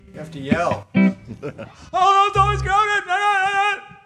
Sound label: yell